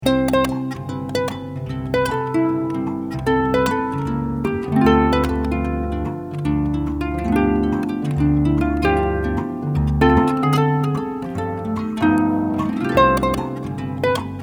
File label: jingle rock, Jazz Harp Christmas